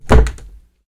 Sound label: door close